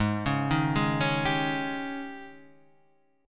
G sharp Major 7th Suspended 4th, Guitar, Half Step Down